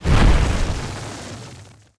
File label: DROP